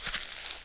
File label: step grass; SpecOps2